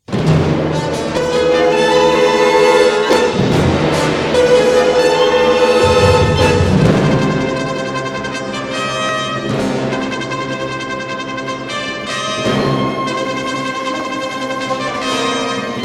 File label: Fanfare; Gavorkna